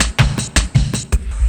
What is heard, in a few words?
LOOP SD, 160 BPM, Drum & Bass; Ueberschall; Drum & Bass Flash Trackz